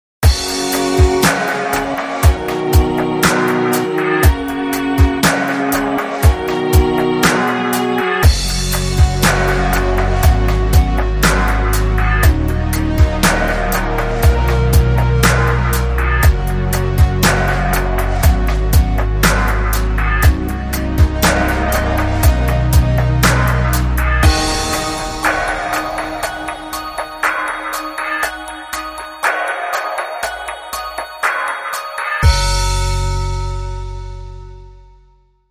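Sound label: Bulgarian Tone Short Rhythm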